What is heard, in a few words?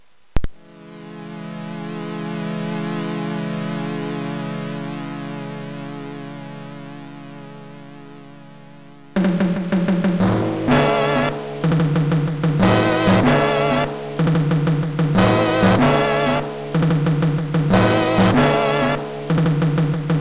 Orchestra